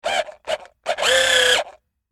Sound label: Industry Electric Drill Tighten Screw Whine Stress Three Times; Drills; SFX Bible